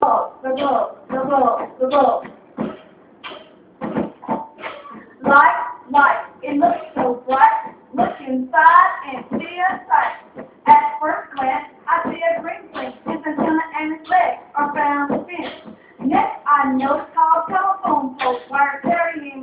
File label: the bulb rap